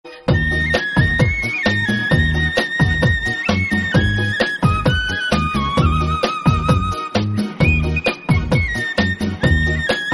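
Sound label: music without lyrics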